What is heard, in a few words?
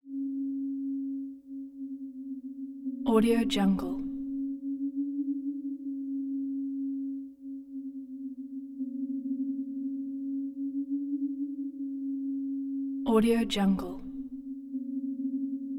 wavering synth tone